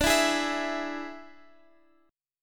D Suspended 2nd Flat 5th D

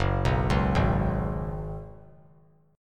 F sharp Suspended 2nd Suspended 4th; arpeggiated; JGuitar

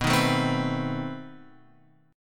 B 7th Flat 9th B down Guitar Open F; B7b9 strummed; JGuitar

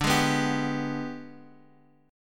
G sharp Minor Major 7th Flat 5th

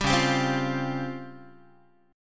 C sharp Major 7th Flat 5th, C sharp, Guitar; strummed; chord

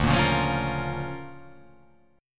D Minor Major 7th Sharp 5th, D

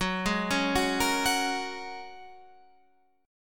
G sharp 7th Suspended 2nd Suspended 4th, guitar; 14,11,11,11,11,14; chord shape

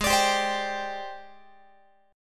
Ab Major 7th Flat 5th Ab, down, Guitar; AbM7b5 strummed; x,16,17,17,16,18

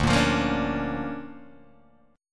D Minor Major 7th Sharp 5th, D, Guitar, Open G

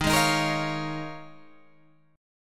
Eb 9th Suspended 4th, Guitar, Open G

